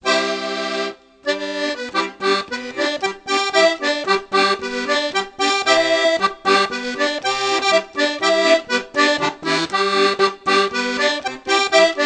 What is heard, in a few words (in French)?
Accordeon a boutons; Ecole de musique